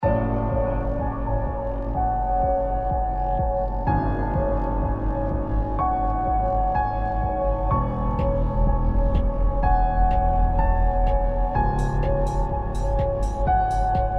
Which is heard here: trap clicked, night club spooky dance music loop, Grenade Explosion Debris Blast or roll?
night club spooky dance music loop